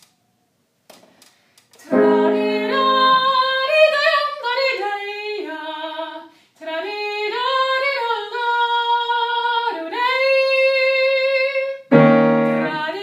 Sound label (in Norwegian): Bruremarsj Sopran